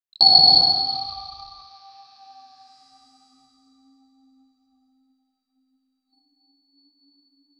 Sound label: enigmatic eye blink trans, glitch